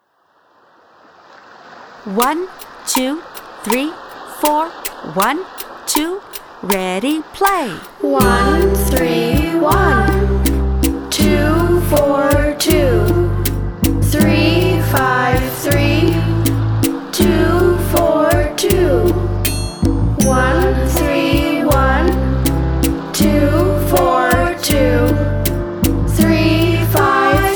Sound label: C Scale